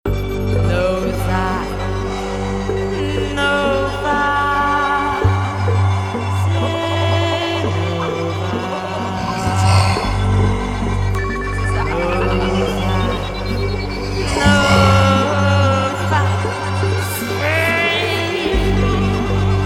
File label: Radio Nova Jingle; Nova 25 ans - 1996